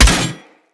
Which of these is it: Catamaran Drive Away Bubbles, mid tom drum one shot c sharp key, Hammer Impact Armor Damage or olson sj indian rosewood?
Hammer Impact Armor Damage